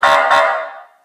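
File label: duck call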